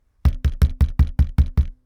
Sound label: wood; 木の板をドンドン叩く音